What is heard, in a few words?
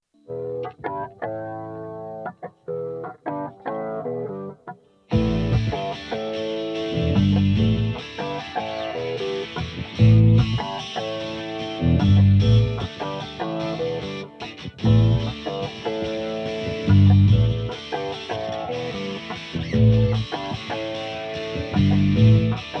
bass jump, looping; asymmetrical, pulsative floating loop with occasional bass dabs